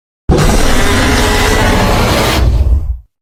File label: roar; thunderroarus